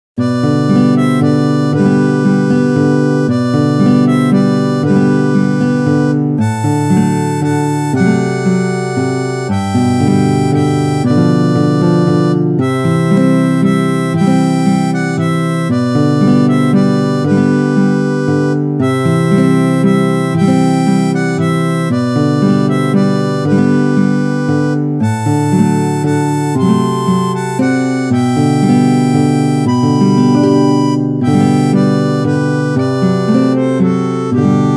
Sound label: Silent Night Harmonica